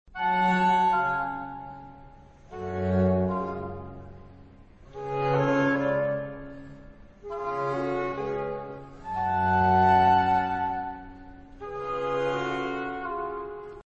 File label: G american baroque; MagnaLoops